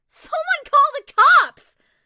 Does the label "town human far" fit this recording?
Yes